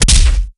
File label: Enemy Take Hit